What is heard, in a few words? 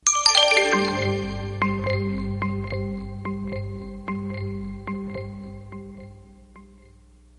music; itgmania; portKit-sm4